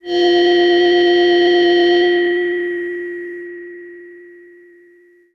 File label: Breath DX100 F3